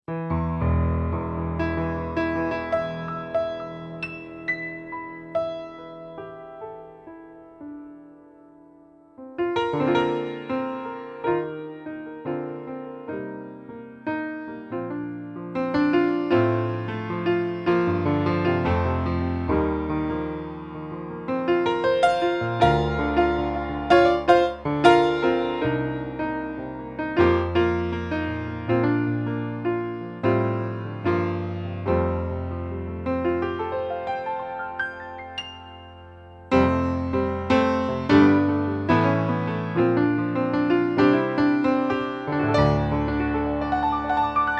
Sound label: Vintage Grand Pop